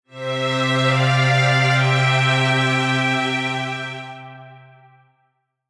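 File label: Strings Polyphony Harmony SA; Poly Hamo SA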